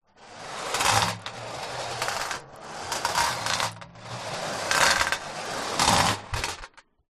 industrial metal ball bearings many roll on metal sheet